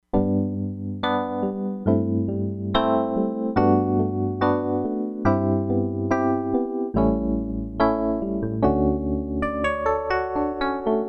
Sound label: ELECTRONIC PIANO, Crumar Bit 99; Factory Programs